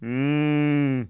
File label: mmmm, phrases; Wired for Sound Pro CD; Aristosoft, Inc.; homer